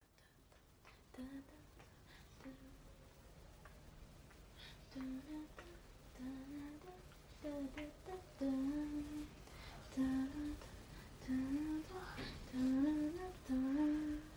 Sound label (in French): communication interpersonnelle walkman